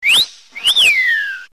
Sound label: Whistle, Ringtones